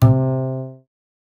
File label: c3 pizz